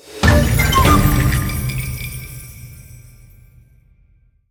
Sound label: win Medium; templeofnudges